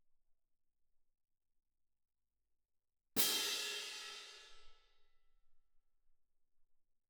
Perc

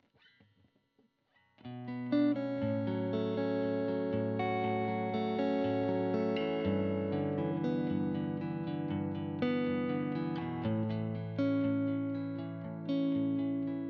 GTR Royer

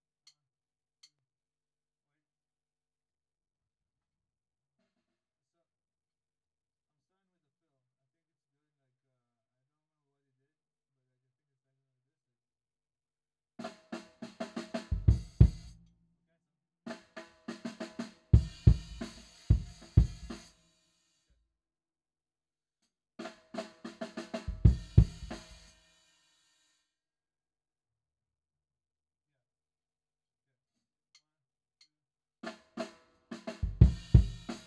Kick IN

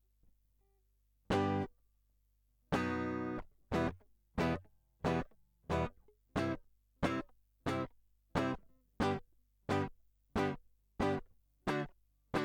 Gtr Line, RNR for piano

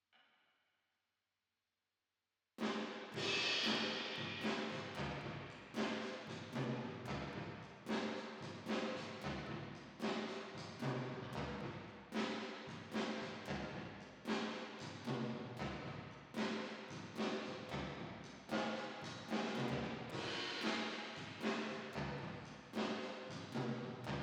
Drums Verb

